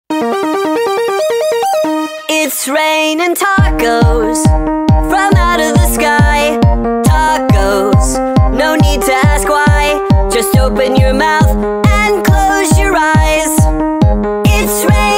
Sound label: Bgmusic; 2048